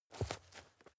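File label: step dirt heavy boots scuff, footsteps; schritte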